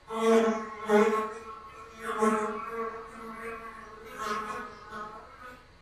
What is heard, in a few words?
flies; dfcaverns; df ambience